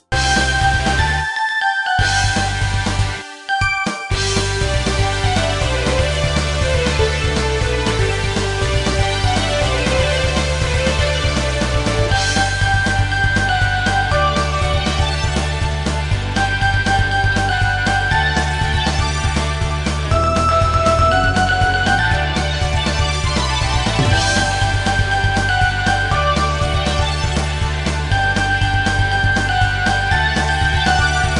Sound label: Opening (Instrumental); moke moke taishou dendou musume arisa